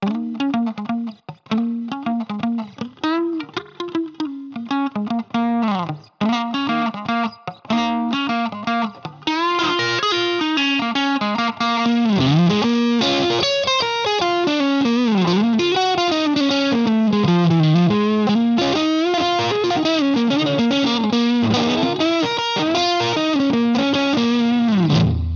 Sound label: middle HB SC